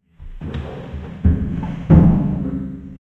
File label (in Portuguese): batidas e sons de, haunted house